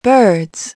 Birds

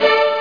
d50 arco

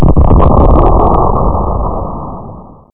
Earth Shake; Realmz; The Family Jewels

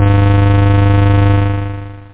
analog; OctaMED SoundStudio V1; synth misc

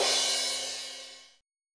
cymbal

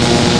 Electronic Fritz; A-10 Cuba; PC Gamer 40

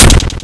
Shield Hit; Zone Warrior

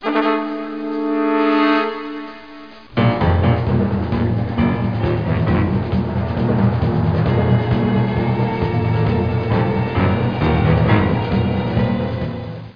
theme; Foul Play Demo

